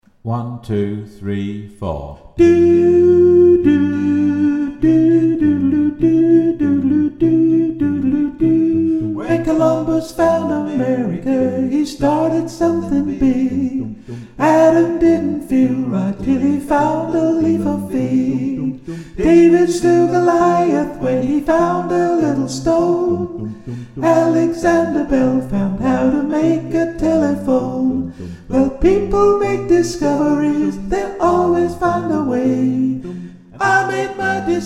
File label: SOPRANO